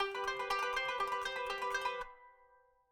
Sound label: kanun